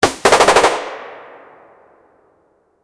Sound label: mpx 9mm single gunshot